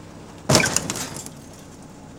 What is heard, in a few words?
TRUCK JUN, Transportation, Truck Junk